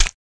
Corn Snap Wet Thwat, gore stabs & eat

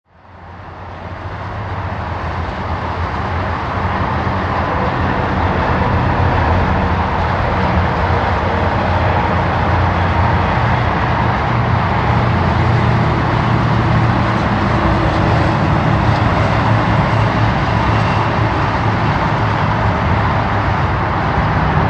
Traffic Rumble Busy, highway

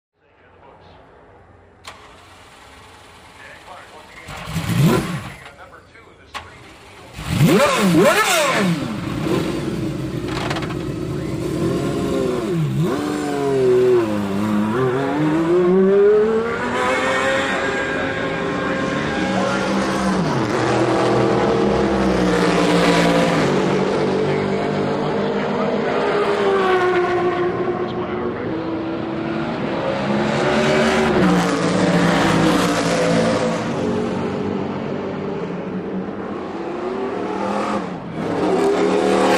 Pro Sports Car Race

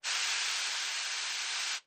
Medium Aerosol Spray; aerosol can

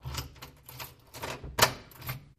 Dead Bolt Lock Key; lock & keys

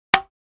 2x4 Impact, hits

